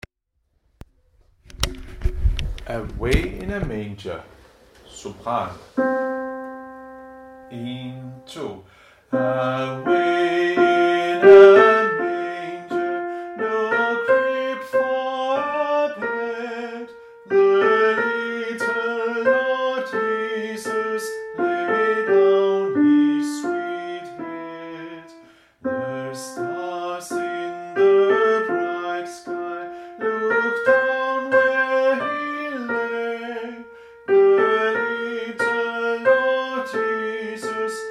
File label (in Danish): sopran; Away in a manger; harlev koret